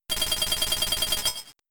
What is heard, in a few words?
Blizzard; Pokemon; Gen 3; attack moves; RSE